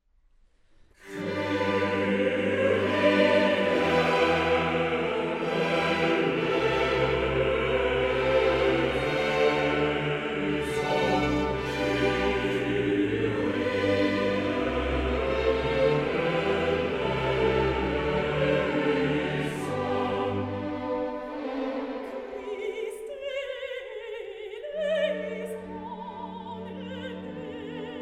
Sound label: Missa brevis in G Kyrie; Mozart; Missae and Requiem; Davis, Gardiner